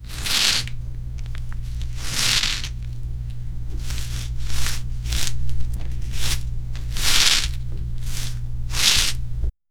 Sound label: soft rubber slide